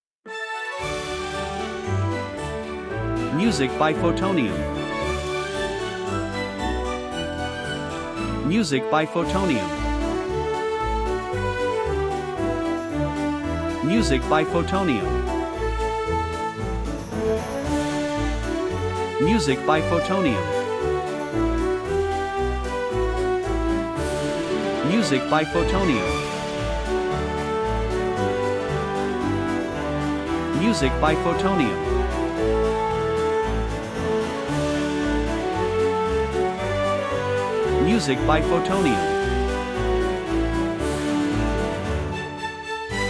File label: Exciting and Happy Christmas Xmas Holiday Music